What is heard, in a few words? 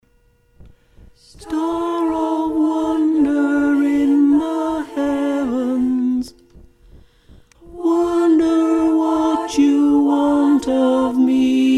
Star Of Wonder - Alto, Choir Harmonies; Carols